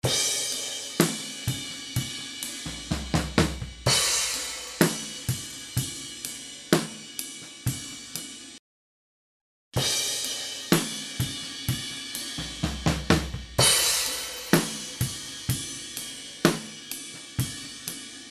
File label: drum OHs; AA CM47; 4047 vs CM47